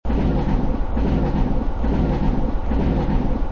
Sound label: train loop